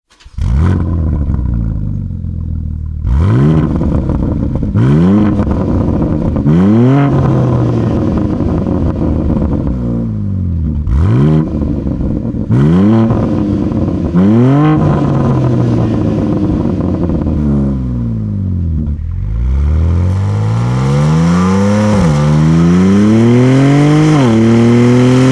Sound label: BMW G80 M3 Racing GPF back; Remus